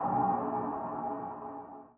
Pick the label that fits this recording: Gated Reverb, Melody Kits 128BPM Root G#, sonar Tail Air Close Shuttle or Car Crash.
sonar Tail Air Close Shuttle